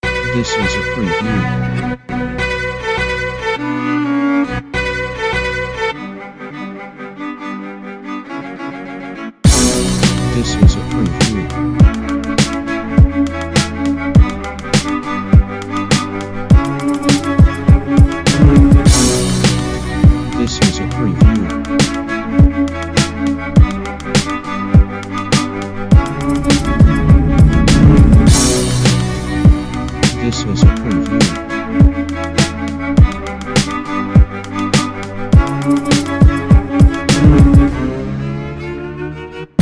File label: Power Motivational Strings